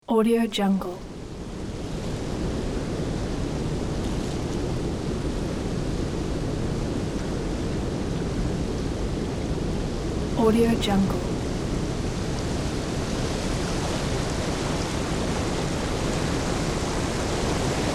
storm at sea

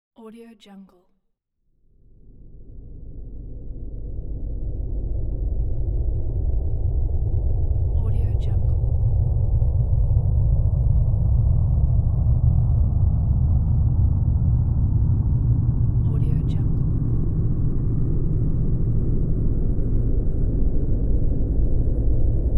drone ambience

